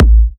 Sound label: Kicks A; markus hakala